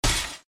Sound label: enemies penguin shoot; Adventure Time - Break The Worm; ThrillGames